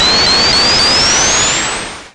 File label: SPIN; Sonic R; Windows